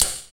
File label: FX Dance